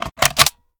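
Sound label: reload magazine in; gmod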